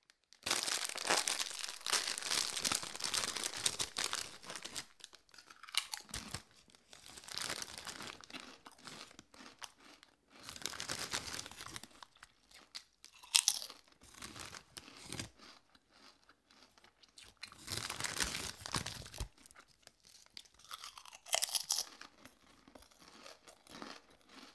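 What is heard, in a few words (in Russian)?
поедание чипсов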